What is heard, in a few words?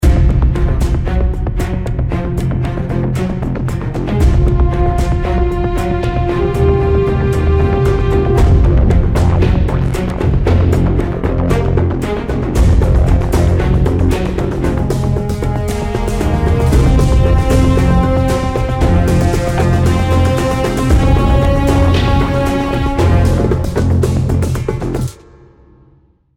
start round, music; CS2; Counter Strike